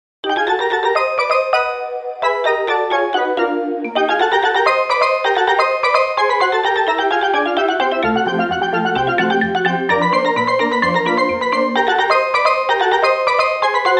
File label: Rag, piano roll ragtime